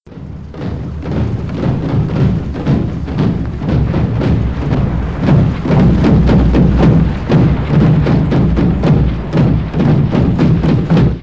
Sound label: Drums